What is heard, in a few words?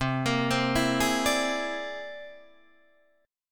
C Augmented 9th, Guitar, Open D